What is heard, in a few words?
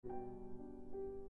G chord; Schumann Kinderscenen 1